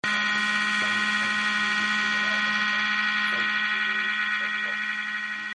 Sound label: Buzz